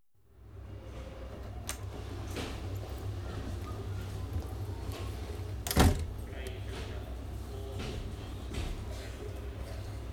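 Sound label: Diesel Trains Station atmosphere; BBC Sound Effects Library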